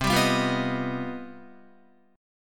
C Minor Major 11th C down Guitar 4F 4A 5C 5G 5C 6E; CmM11 strummed; JGuitar